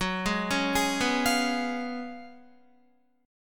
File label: Gb Major 7th Suspended 2nd Suspended 4th, Guitar; GbM7sus2sus4 arpeggiated; JGuitar